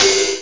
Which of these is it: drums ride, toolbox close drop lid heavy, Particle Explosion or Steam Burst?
drums ride